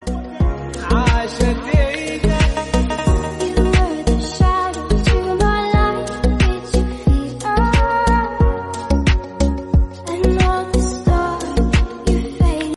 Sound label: Arabic English